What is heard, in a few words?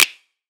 Snap; Antidote Advent; Infected Track Drums